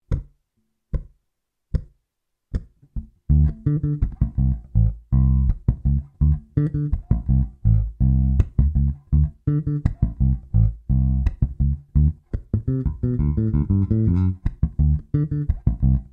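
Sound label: Pickup slap exercise, bass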